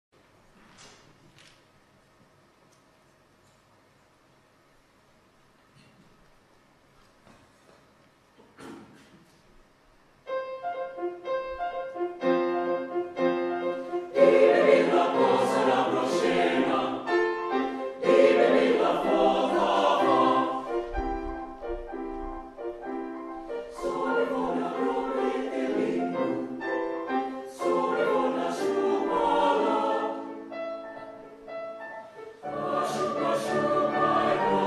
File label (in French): Chants moraves